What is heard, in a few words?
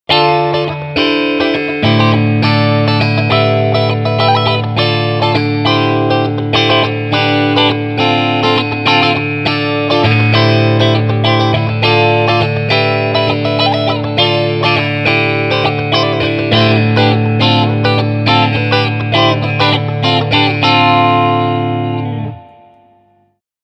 Clean Strumming